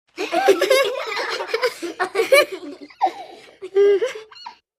5 Yr Old Giggles

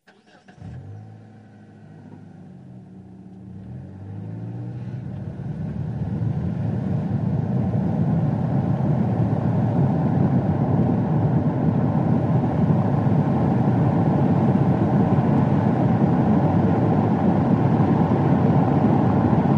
Sedan Accel 60mph St

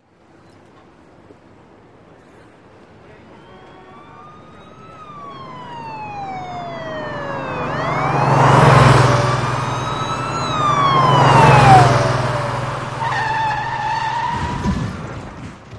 City Street Busy; horns sirens

